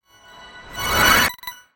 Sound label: sfx gacha prepare